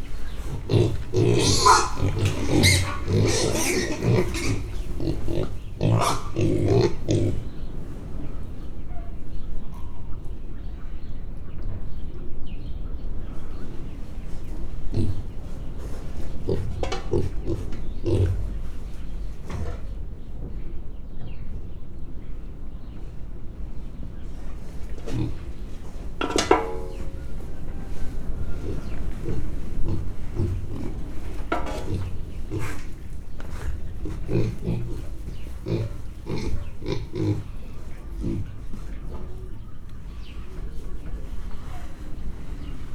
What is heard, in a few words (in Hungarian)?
10 hetesek röfögnek, mangalica; Hortobágyi Nemzeti Park